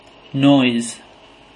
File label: noise